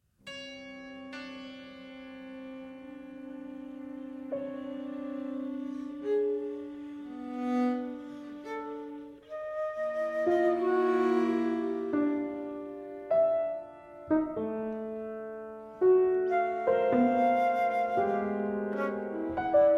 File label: for flute cello and piano; between the lynes